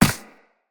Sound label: Run Ice, Footsteps; Characters; Operator